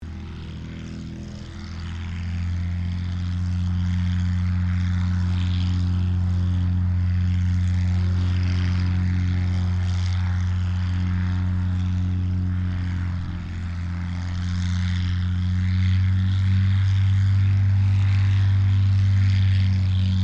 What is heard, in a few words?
Aircraft P-40 Kittyhawk taxi Shutdown Distant, WW2 Kittyhawk or Warhawk fighter plane; 1940s; Airplanes